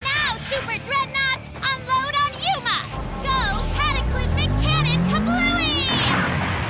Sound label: Kaboom